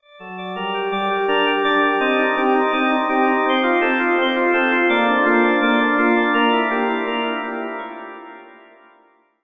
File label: Organ; Ganymede Organ; Gamma